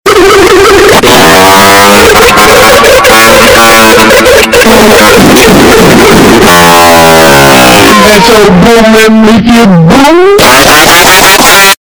russian brainfart even louder

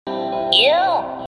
yoo aaaah

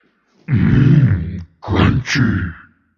demon crunchy

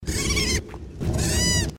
tank goat

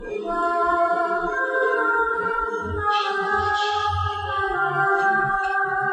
singing; mgs peace walker

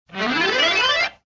quick accordion gliss up

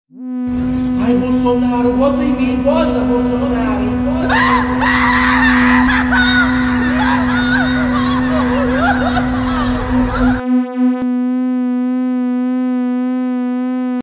hahahahahaaaa

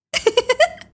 laughs; et

